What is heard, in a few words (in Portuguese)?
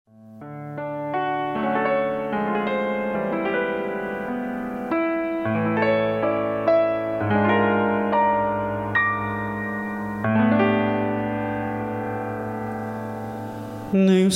Cantada e Sax